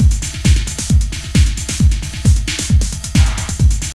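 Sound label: drum loop; X-Static Goldmine 3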